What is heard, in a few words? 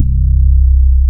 BAS MKS-50; Best Service Gigapack 2